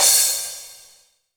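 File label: cymbal, real mega drums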